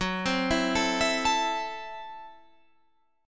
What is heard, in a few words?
A Minor F sharp; Am/F# arpeggiated